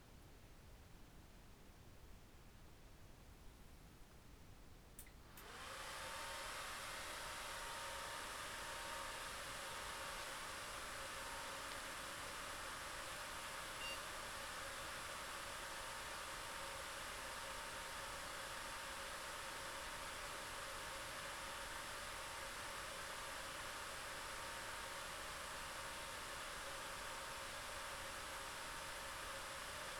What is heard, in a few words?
noise; quad fx